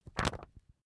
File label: magazine skim